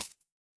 feedback celebration

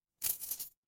glass shatter

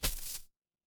footsteps single

